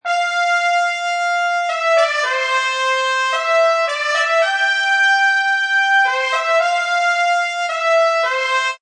Och Brs Mldy C, Orchestral brass melody C Major 110 bpm.